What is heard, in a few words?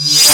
scifi, SCIFI & SWEEPS; Techno Trance Essentials